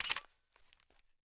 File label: m3s90 load; weapons; garrysmod; m3s90p